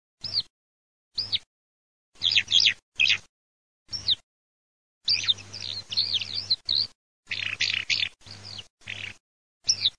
ALLODOLA

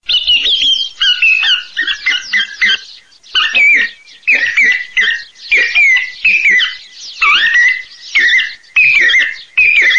TORDO, birdsound; LOMBARDI

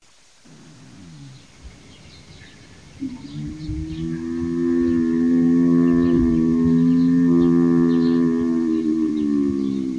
CERVO elk, birdsound

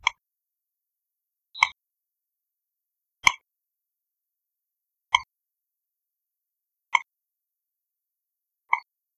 Folaga

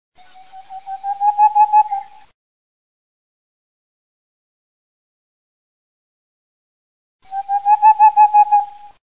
CIVETTA CAPOGROSSO

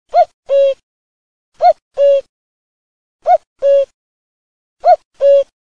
CUCULO, birdsound

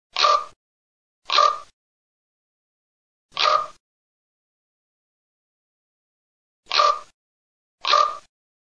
CORVO

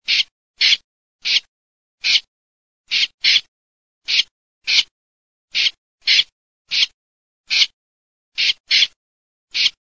BECCACCINO

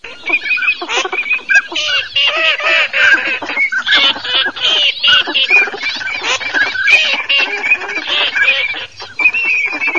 ALZAVOLA CODO

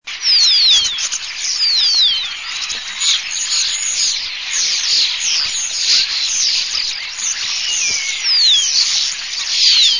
STORNI CAPANNO

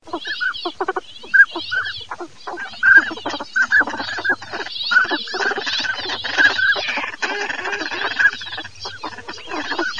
CODONE FEMM

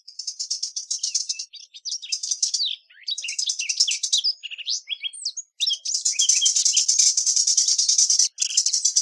CAPINERA

